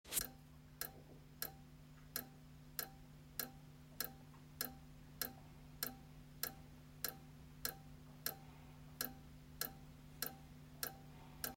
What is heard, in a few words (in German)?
Klacken